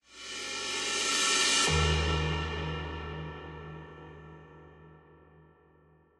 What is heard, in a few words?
song End